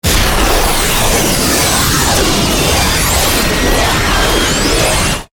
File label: Ray Beam Burst, Scifi; Flange, Warble, Science, Fiction; Battle; BlastWave